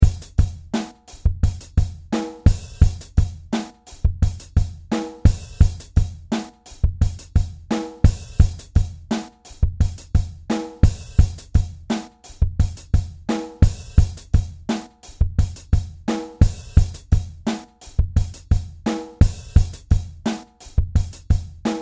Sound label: clean sped up